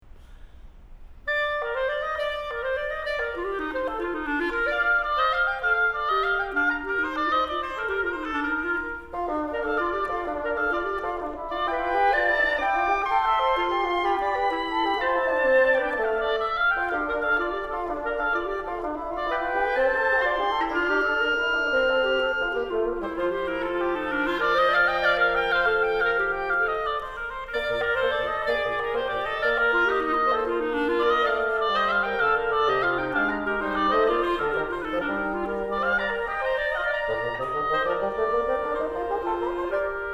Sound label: Trio Allegro